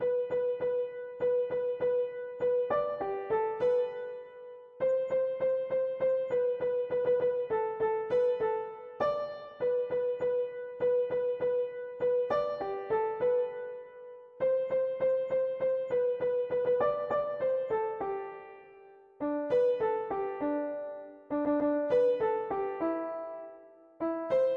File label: jingle bells